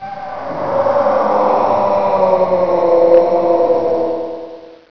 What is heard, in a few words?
die